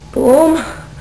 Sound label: boom